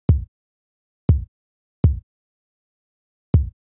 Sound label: Kick Stomp; hydrogen